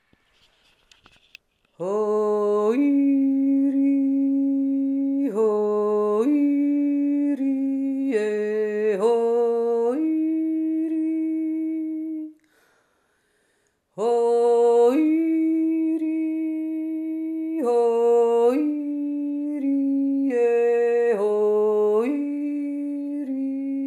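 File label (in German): kärntner jodler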